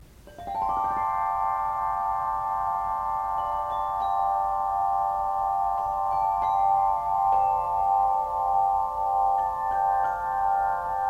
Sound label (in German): Glockenspiel